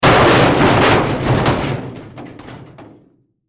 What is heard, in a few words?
Crash Metal Smash Debris Large Pieces; Blastwave